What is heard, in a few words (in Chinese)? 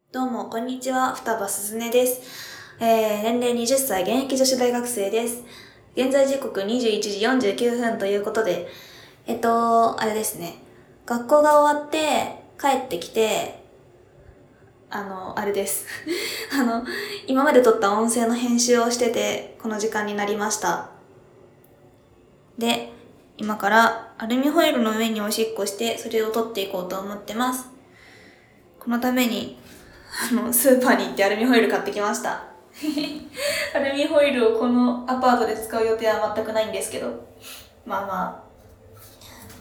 鋁箔紙上排尿 降躁; G-Sound